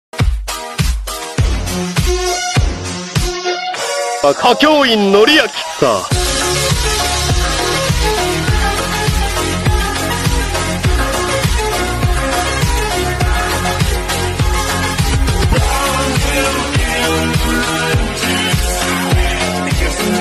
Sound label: arcade remix; Kakyoin